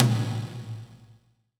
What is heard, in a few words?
TOM, percussion reverb; MARKYDRUMS